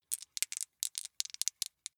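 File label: small two rattle in hand, dice; wuerfel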